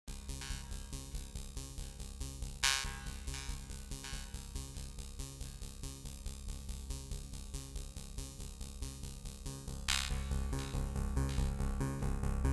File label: 80ies Noise Bass